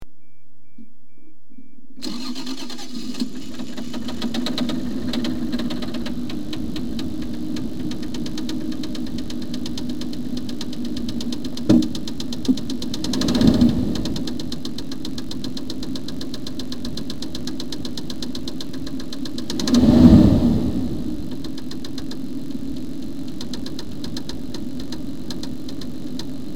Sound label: rod knock